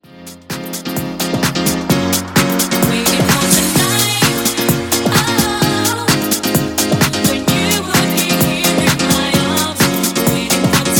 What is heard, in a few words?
house, 129 bpm, Bbm